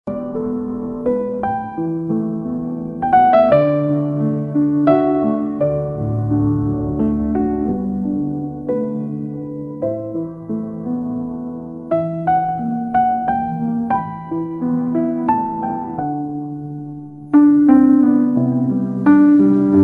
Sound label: romantic and emotional piano music; AI Test Kitchen